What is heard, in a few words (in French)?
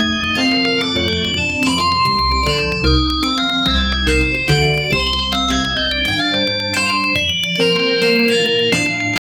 un long song continue pou, magical